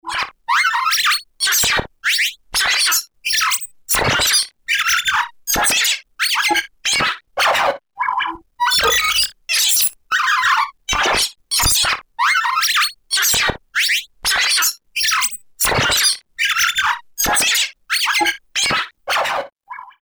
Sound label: droid